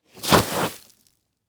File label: body fall in leaf debris